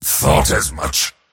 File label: move pain; dota; mars